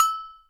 Agogo H, Yamaha DTXpress; Percussion

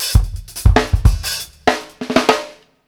SWING IT R, Drumworks FILLS 96; Sampleheads - New York City Drumworks VOL-1

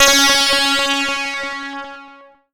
C#, Synclav FM; Big Fish Audio; SPACE1

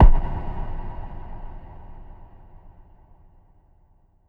EFX Brite Punch; Polyend; Plughugger

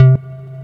FISH C3; Q Up Arts; Dream Experience

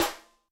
Ana Sl Rim, Yamaha DTXpress-II snare

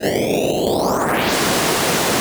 Air FX, Filtered Noise

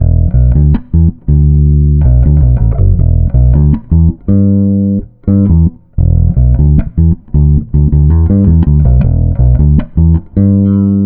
Bass, Electro Lounge; Sonic Foundry (Sony Creative Software)

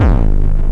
dist kick; Drum Hits; BASSDRUM

